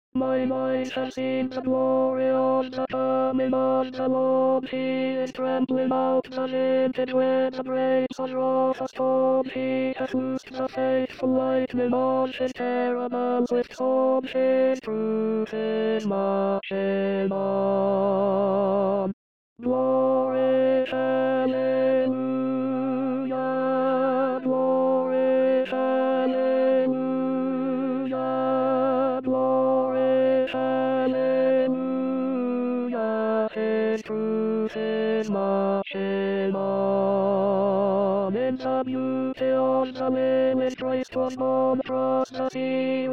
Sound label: Tenors